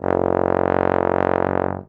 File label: BARITON; Best Service; Alpine Volksmusik